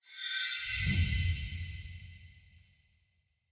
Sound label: Capture Levitate End; Encounters; Animotes